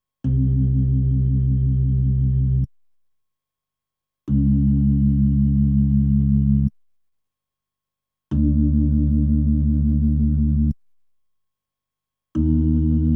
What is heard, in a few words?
B3 Organ; Masterbits CLIMAX 8